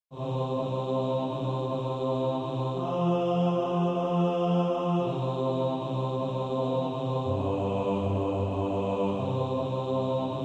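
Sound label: bass, practice music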